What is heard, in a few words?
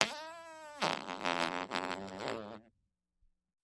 Close Up Fart Creep; farts